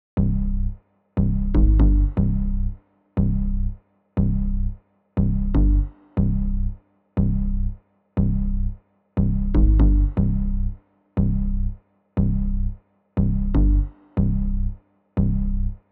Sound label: bass solo reverb